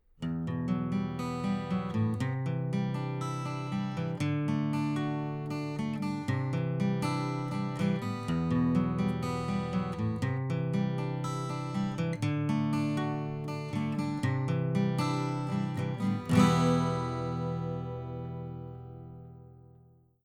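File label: Guitar Omnidirectional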